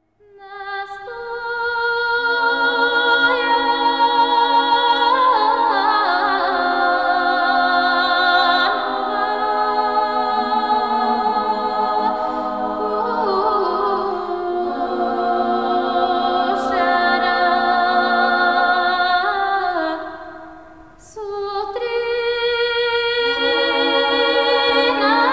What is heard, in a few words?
female choir, bulgares